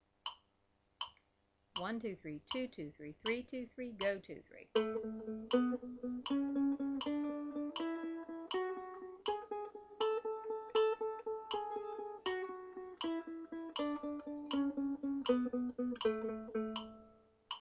A Scale Triplets 80bpm, mandolin

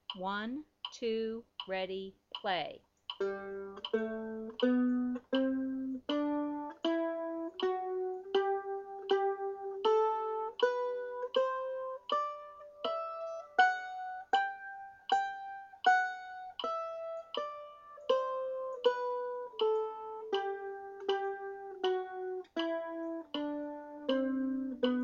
G Scale 80bpm, mandolin

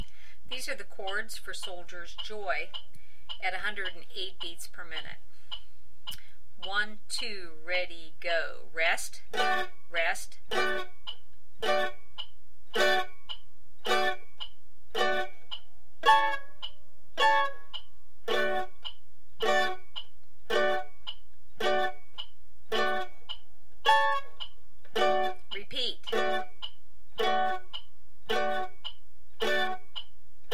Soldiers Joy Chords 108bpm, mandolin

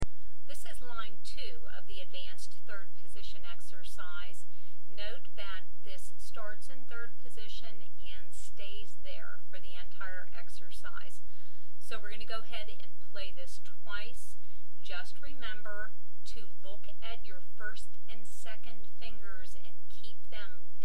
Advanced 3rd Position Exercise, mandolin

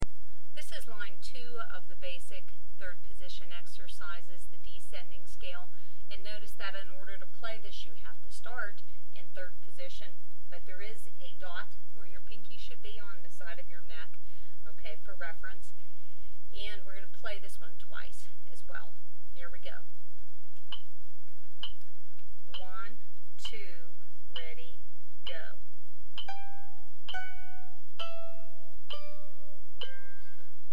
Basic 3rd Position Line, mandolin

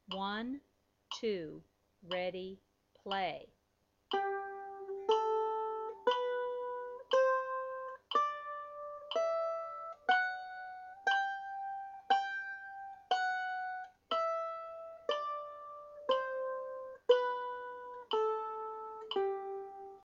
G Scale Down Up 60bpm, mandolin

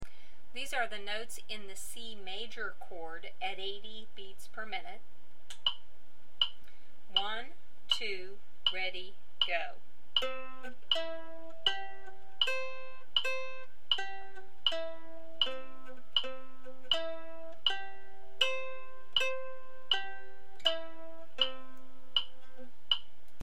C Major Arpeggio 80bpm, mandolin

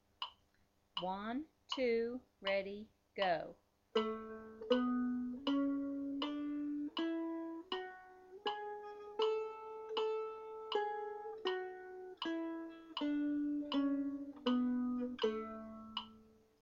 A Scale 80bpm, mandolin

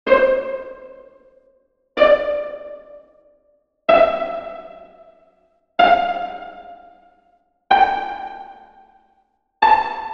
Second Position Exercise 80bpm, mandolin

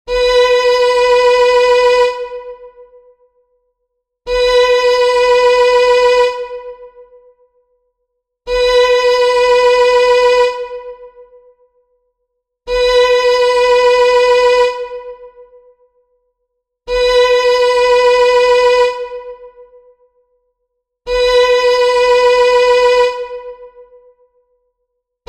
Fifth Position Exercise 60bpm, mandolin

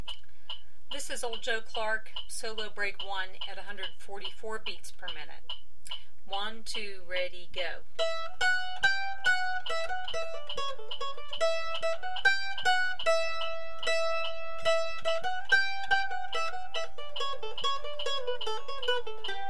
Solo 144bpm, mandolin

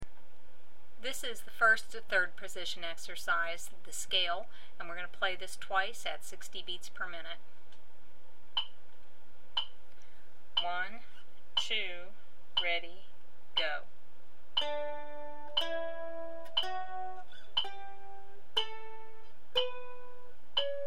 Third Position Scale 60bpm, mandolin

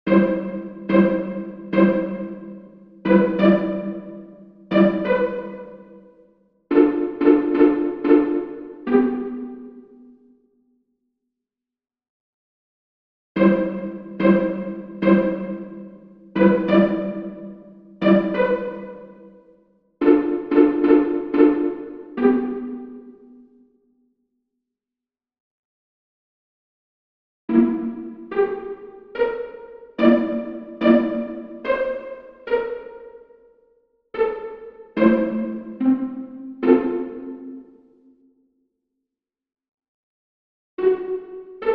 70bpm, mandolin

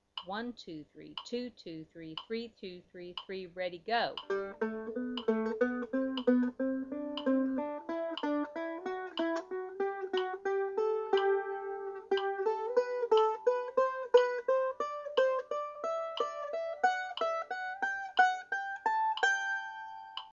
G Scale Triplets 60bpm, mandolin

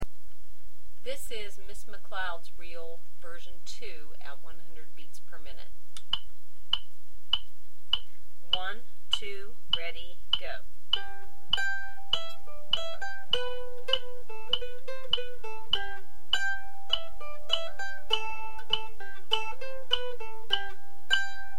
Reel 100bpm, mandolin

